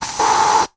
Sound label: household womans antiperspirant aerosol can spray